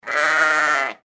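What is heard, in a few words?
sheep sound "beeee"; Звуки, которые издают овцы и бараны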